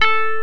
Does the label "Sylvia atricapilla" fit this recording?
No